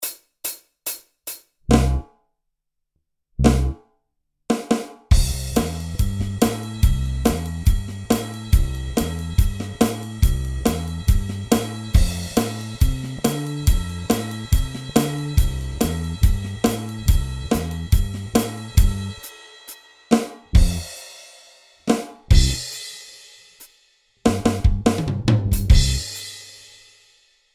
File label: looking back rhythm